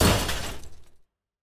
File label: Car Destruction Hit Break